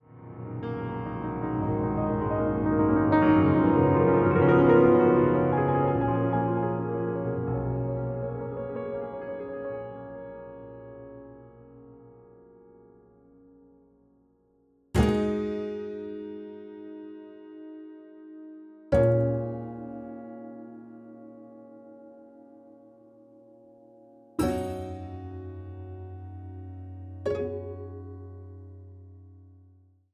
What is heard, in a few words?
silent rain